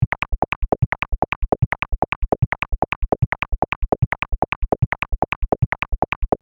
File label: E Drum acid beat loop